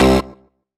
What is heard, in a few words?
Dance Mega Synth; Best Service; 90 s Sample CDs